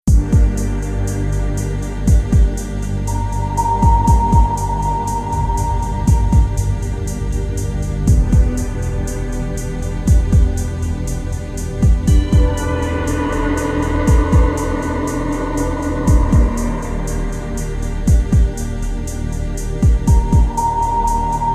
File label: accumulate evidence, off melody